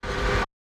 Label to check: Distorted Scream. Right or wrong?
Right